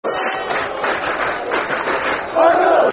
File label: Argyle (Clap), Football Chants; Plymouth Argyle Pafc